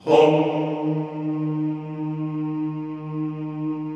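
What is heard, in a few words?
Vohmm Houuu, Chorus; Sound & Vision; Gigapack I CD 2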